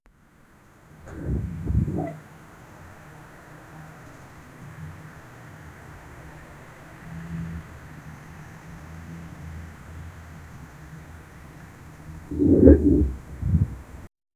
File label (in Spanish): sonidos de velociraptor, aggressive